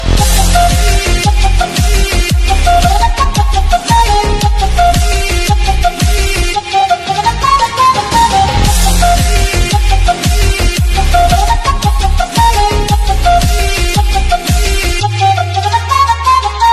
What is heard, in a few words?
romantic music ringtone, bollywood